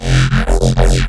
Wobble F

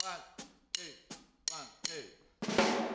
Room, 162 BPM; drums

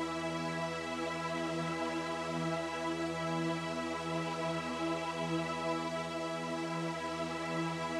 Drone; stereo toolkit samples; Non Tempo Loops

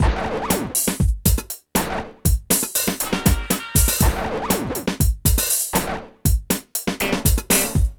PHATT BEA

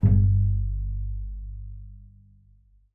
G1, bass pizz; Xsample VOL-5

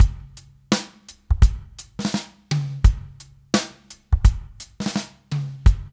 DRUMS, Loops; East West

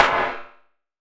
Clap, Gated Reverb; musicradar

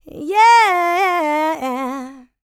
licks, vocal, dance; ILIO; Vocal Planet

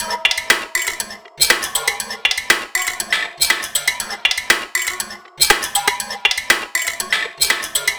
Finger Piano Loop, Ethnic Instruments; Sonic Foundry (Sony Creative Software); The Electronic Music Manuscript Collection

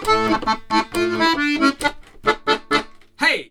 accordions; USB Soundscan vol.40; AKAI

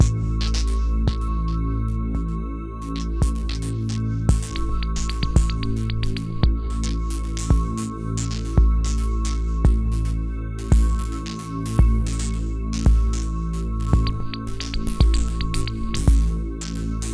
philter (dark orb); Sonic Foundry (Sony Creative Software); Ugly Remnants VOL-2

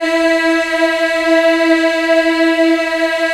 E3 WOMEN EH, Choir; USB Soundscan vol.28; Acoustic & Synth; AKAI

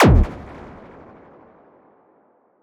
Impact

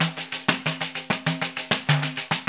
PRC XTAMBO, Tambourines; Tamborines

